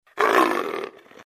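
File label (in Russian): korotkiy ryk leoparda, short leopard roar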